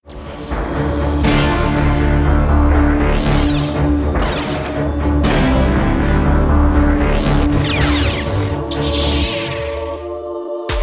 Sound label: dark side sci fi dubstep